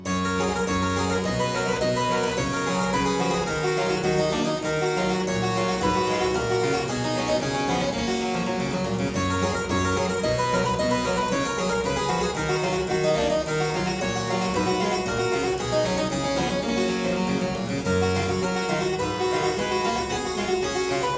Aria in G major Partita 23, Harpsichord

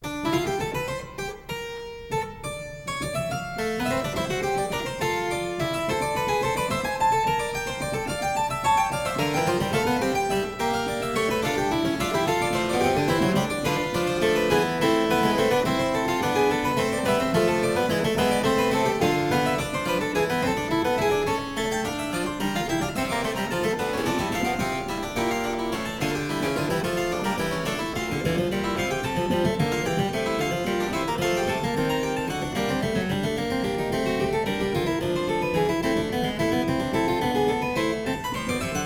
Suite in D Minor - Allegro - Fugue, Harpsichord; Suite No. 3; HWV 428; Handel; Suites for Harpsichord

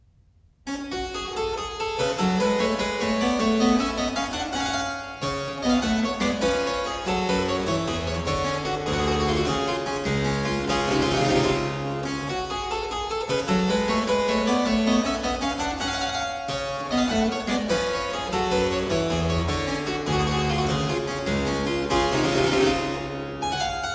Jigg, harpsichord; Complete Chamber Music; Brilliant Classics; Miscellaneous pieces for the harpsichord

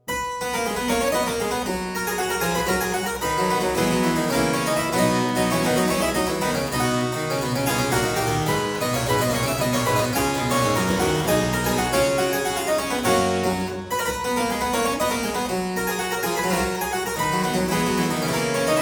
Aria in C major Variatio 4, Harpsichord; Buxtehude; Complete Harpsichord Music

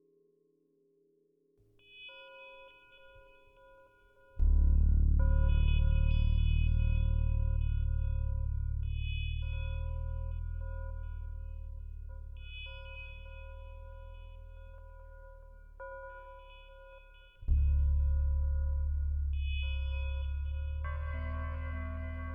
Dark Cracked Bells with Bass

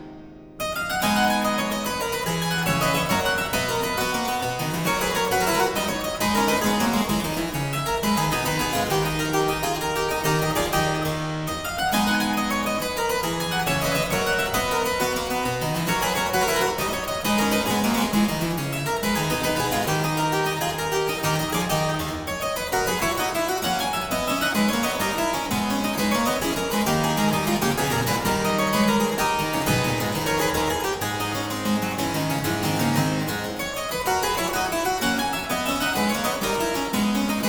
Courant simble in A Minor, Harpsichord; BuxWV 245; Variatio 6; Buxtehude; Opera Omnia; Harpsichord Works

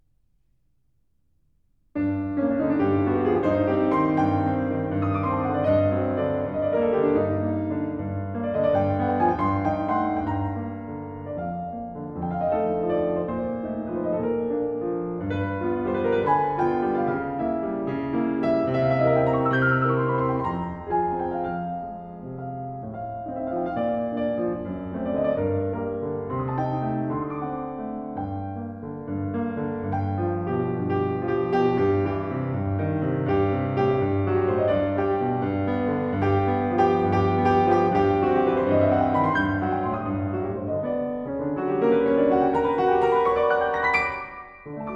Small Piano Pieces, Allegro agitato; Grieg; The Complete Music For Piano

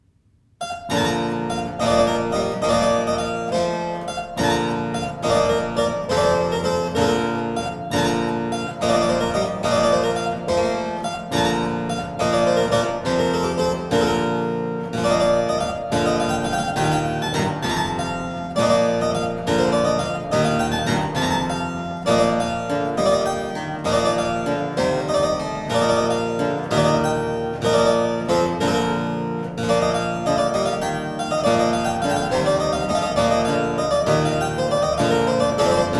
harpsichord; Byrd; Wilson's Wilde, BK37

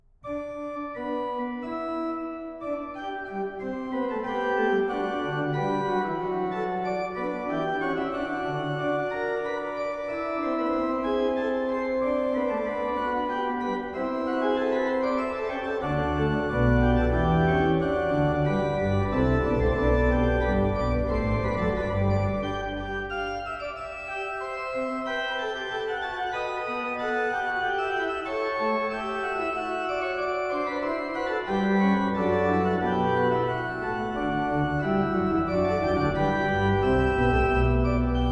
Fugue in G major, Organ; Bach; Christopher Herrick; The Complete Organ Music; BWV581

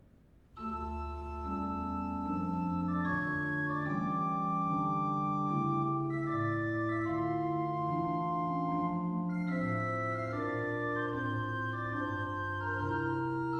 Organ Concerto Adagio; Handel; Organ Concerto Op. 4, No. 4

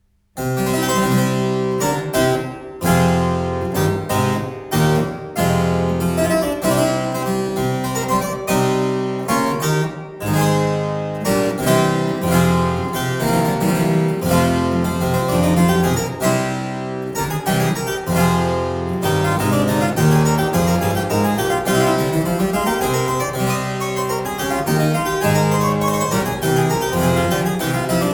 Galiarda (harpsichord); Byrd

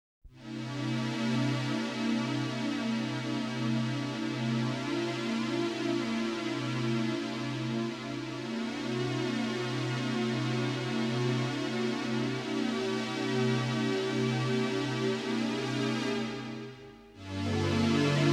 Erotic Strings

